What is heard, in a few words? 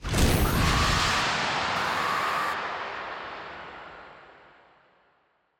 hydrogen launch; OpenFrontIO